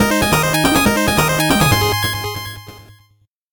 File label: 8bit jingle slow